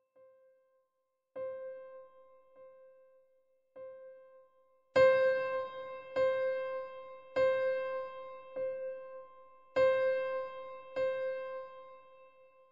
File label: Dynamics Piano pppp to ffff Mixed